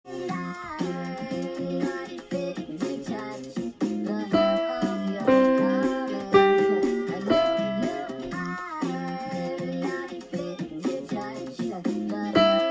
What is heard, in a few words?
Vox Low